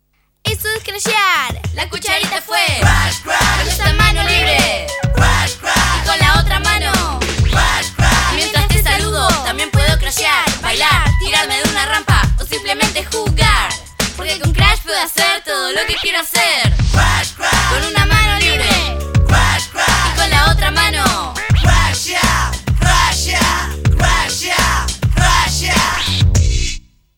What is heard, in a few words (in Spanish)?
cantados, ninos